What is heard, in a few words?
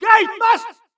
yells